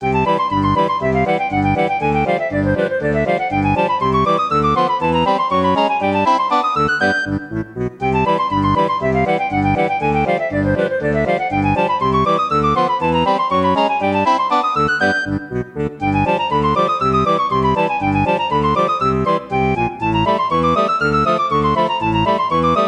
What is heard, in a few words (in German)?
zirkusmusik